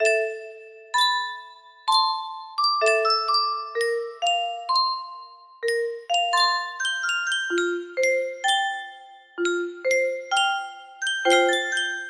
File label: music box, music box melody